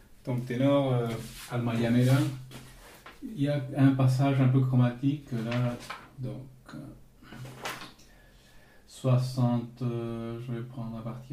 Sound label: tenor passages